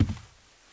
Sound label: carpet step, Footsteps; civ13; civ14